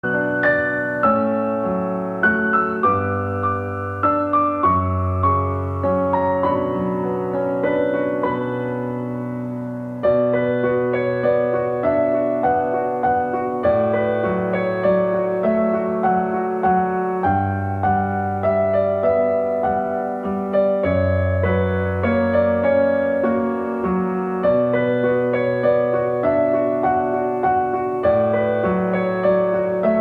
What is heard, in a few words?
Soothing sentimental narrative; MIUI12; hOS 1